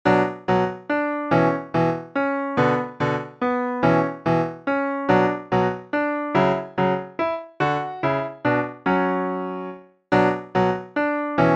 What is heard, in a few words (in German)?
Staccato, Klavier; Reinecke; tief